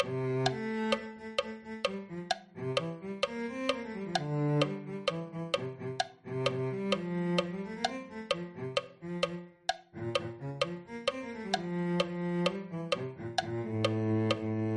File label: cello